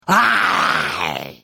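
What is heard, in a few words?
Attack, Khajiit female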